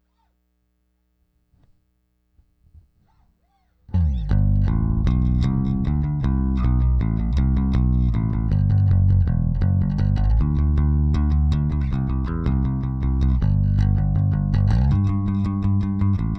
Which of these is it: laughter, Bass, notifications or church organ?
Bass